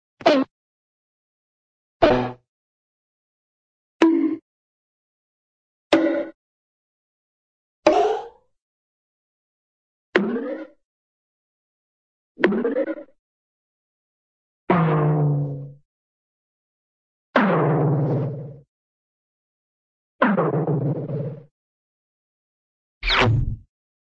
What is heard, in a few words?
hit, cartoon flat bonk